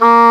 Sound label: WND English Horn; Roland LCDP04 Orchestral Winds